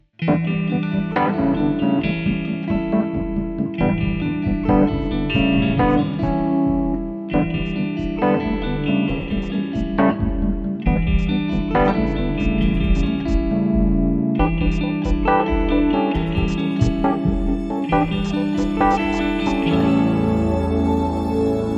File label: Retro Advertiser